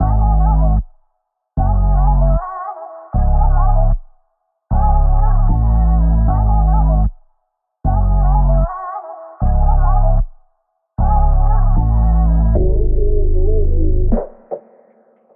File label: RIDE 153 G-m